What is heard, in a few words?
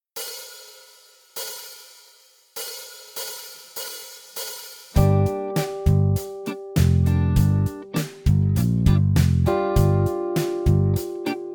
G Minor Blues Backing, guitar